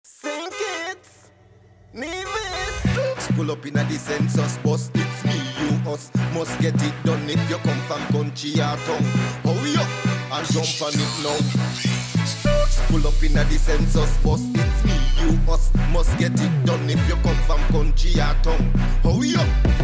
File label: Census Bus Jingle; St. Kitts